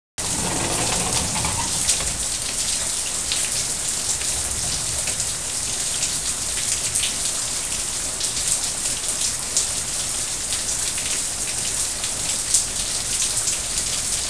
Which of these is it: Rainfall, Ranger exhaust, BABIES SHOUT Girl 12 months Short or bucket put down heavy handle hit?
Rainfall